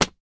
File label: Book Slam Slap Book